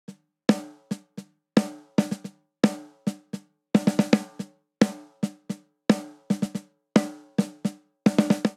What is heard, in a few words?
Classic Disco Snare Only 111 bpm; 4 4; MIDI Clips; Ableton; Factory Packs; Drum Booth